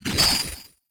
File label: Anime Punch Synth Swirl